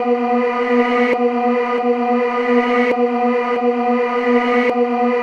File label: Beam